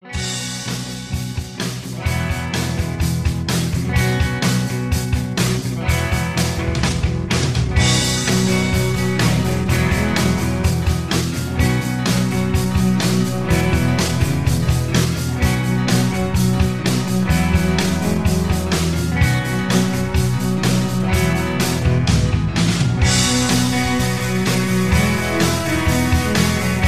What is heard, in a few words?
Instrumental Lower Key